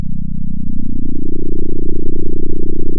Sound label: pop, sound of a small motorcycle